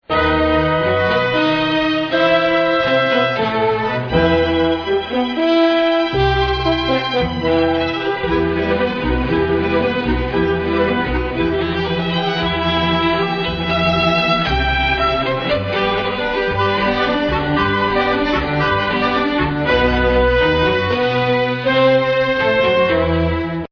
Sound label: music; little einsteins